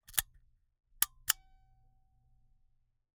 Camera, Argus, aluminum, steel, professional, fully mechanical, advance film, snapshot; Eiravaein Works; Vaeyan IV